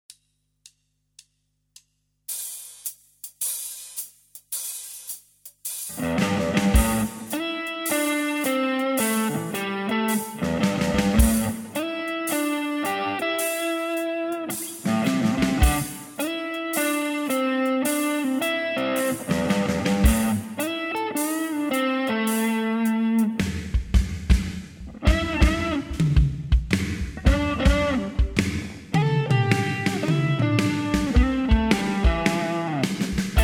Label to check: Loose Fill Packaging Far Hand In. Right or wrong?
Wrong